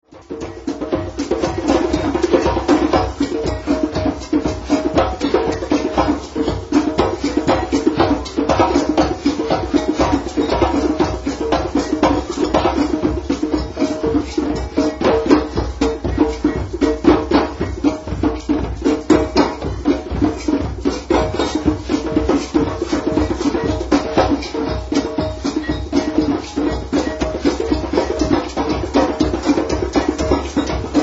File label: Disco